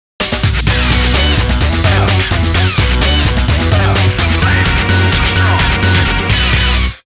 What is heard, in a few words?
Groove Rush